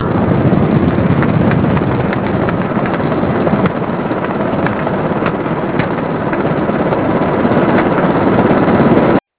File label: helicopter